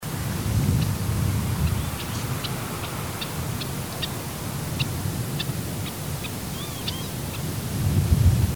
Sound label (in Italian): Fraticello